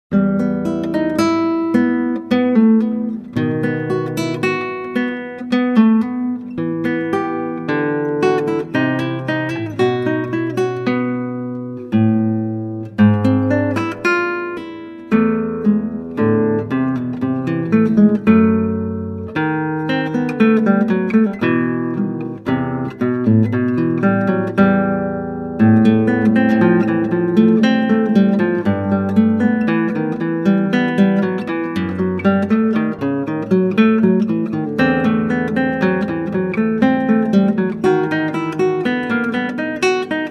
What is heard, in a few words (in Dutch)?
Klassieke Gitaar; Bach 50 Solos